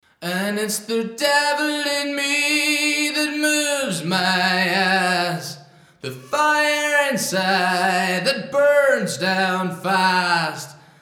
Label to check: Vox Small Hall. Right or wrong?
Right